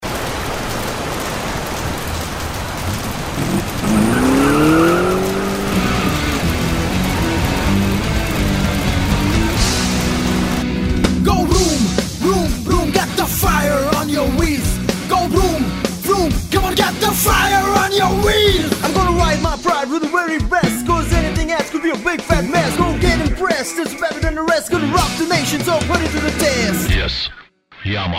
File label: Yamaha Theme